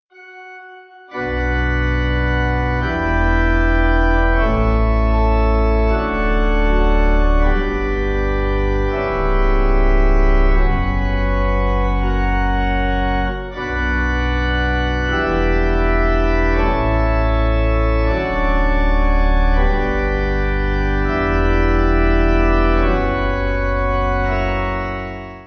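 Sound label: Chant, Pipe, hymn backing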